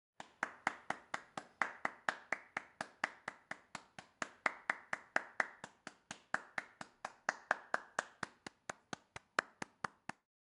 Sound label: Clapping